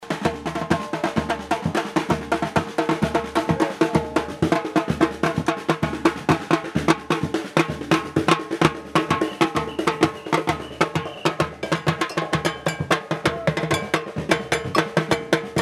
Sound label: samba